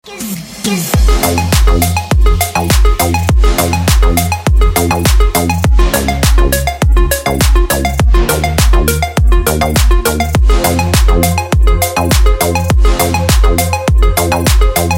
techno

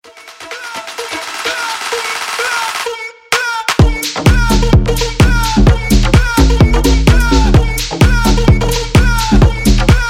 upbeat boom blast; chuck

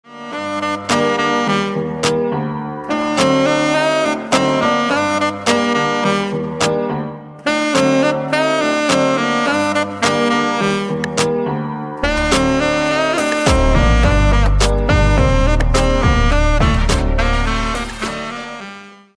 saxophone cover

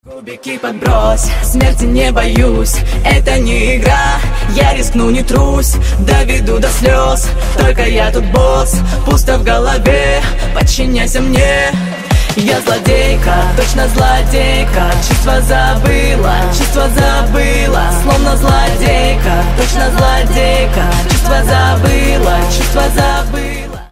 Russian cover

